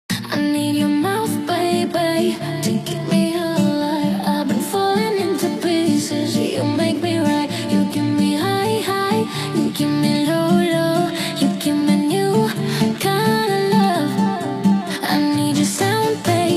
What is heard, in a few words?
Dirty Noise Romanian House